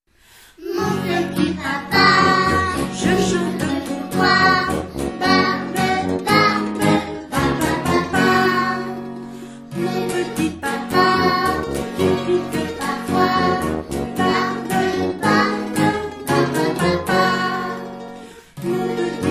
papa chant

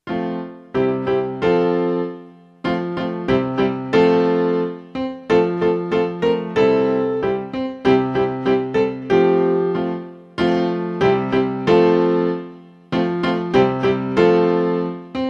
main instr

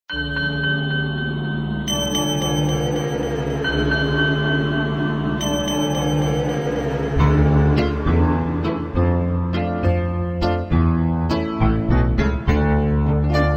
qui a peur du loup chant

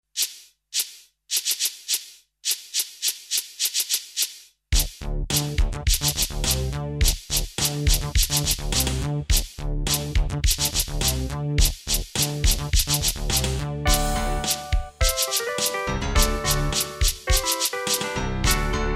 coiffeur instr

